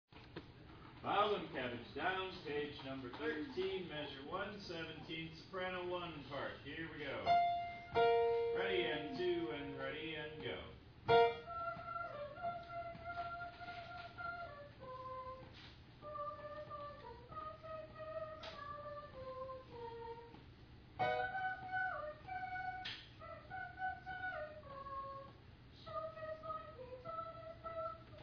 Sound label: SOPRANO 1